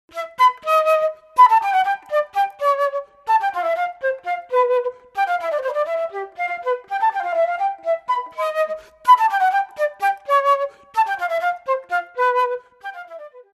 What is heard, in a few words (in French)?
flute traversiere